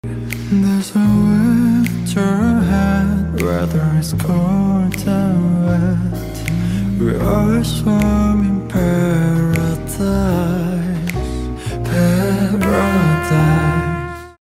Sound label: Ringtone